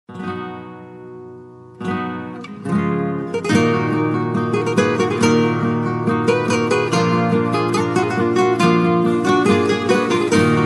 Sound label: Jingle; Triskel